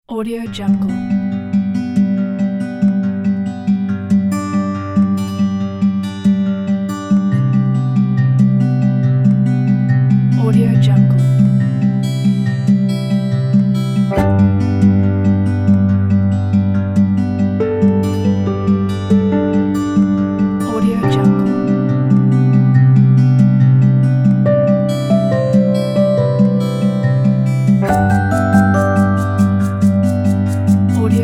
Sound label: acoustic gentle